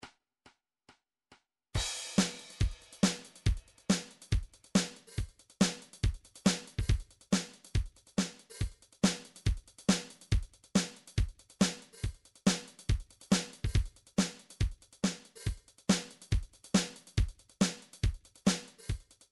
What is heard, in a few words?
dance pop